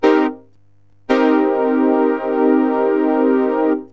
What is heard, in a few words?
MIN 7TH, MS6 BRASS; SYNTH; cubase; CUBASIS